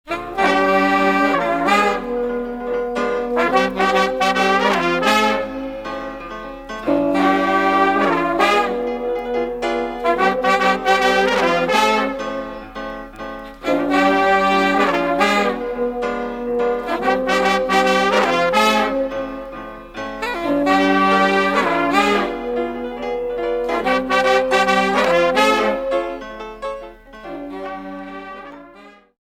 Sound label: Riff; Gospel